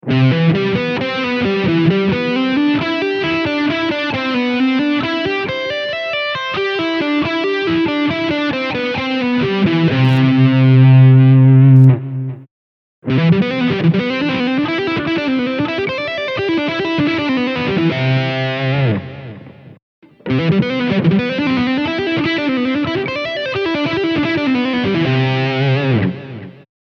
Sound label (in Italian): Do arpeggio, guitar